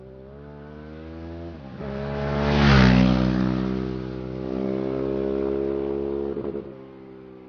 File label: Ducati